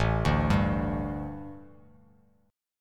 A 7th Suspended 4th A, Bass Standard; arpeggiated

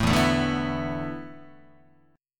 E 7th Suspended 4th, Guitar; E7sus4 strummed; JGuitar

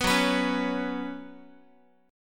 F sharp Suspended 4th, Guitar; F#sus4 strummed; 14,14,14,11,x,x; 4A,4E,5A,5D,5G#,6C#; chord shape

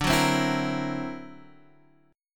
D Augmented 9th, Guitar, Half Step Down

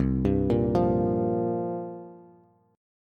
C 7th Suspended 4th, Bass; C7sus4 arpeggiated

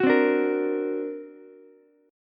D Augmented 7th Ukulele, chord; D+7 strummed; JGuitar

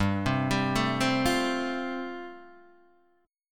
G 9th Suspended 4th, Guitar Open D

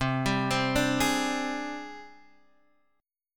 G sharp Major Sharp 11th C, chord

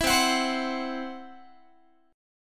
C Major Flat 5th Guitar; CMb5 strummed

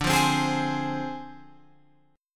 D Minor 7th Flat 5th Guitar Open F

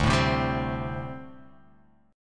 D 7th Suspended 4th, down

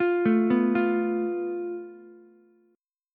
A Suspended 2nd Sharp 5th, Ukulele, Asus2#5 chord; arpeggiated; JGuitar

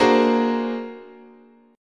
Bb-Augmented 9th, chord, guitar

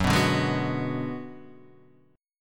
D 6th Flat 5th Gb, chord

